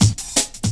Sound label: Build Up Cut, Drum Loops 166.5; Zero-G Jungle Warfare 1, 2 & 3